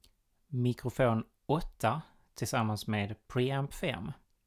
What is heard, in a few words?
mic pre